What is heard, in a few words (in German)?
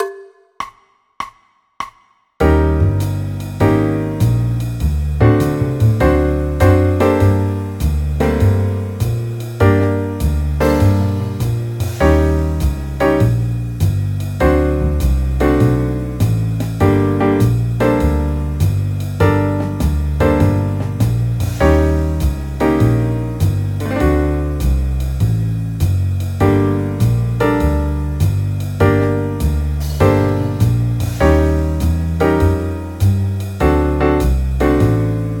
g moll akkordverbindungen